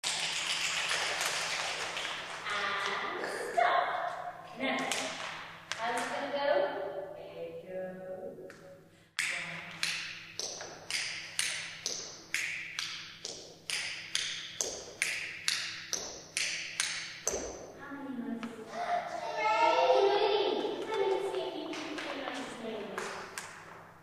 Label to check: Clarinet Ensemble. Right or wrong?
Wrong